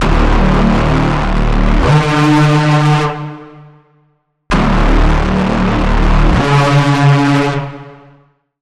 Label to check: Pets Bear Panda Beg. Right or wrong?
Wrong